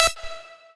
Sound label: lead